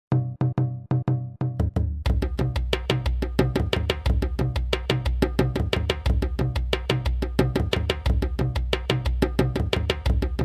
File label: zebola djembe parts 1 and 2